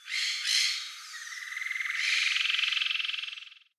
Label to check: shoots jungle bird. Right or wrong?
Right